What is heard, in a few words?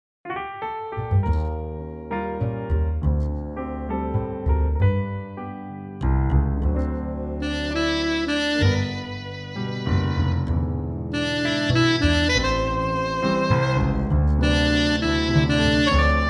G play soprano sax